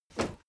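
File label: step crowd run loud single right, footsteps; schritte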